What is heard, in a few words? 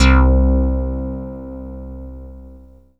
METLB, synthesizer bass; Synthesizers; Soli-Music; World of Synthesizers VOL-1